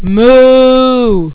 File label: moo